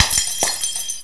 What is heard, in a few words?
knock